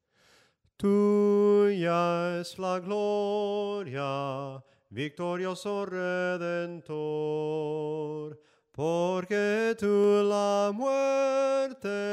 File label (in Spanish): SOPRANO CANTADO; TUYA ES LA GLORIA